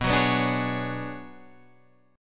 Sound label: B Minor 6th Add 9th B down Guitar Standard; Bm6add9 strummed; JGuitar